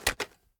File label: reload end, weapons; xmag; gmod; viper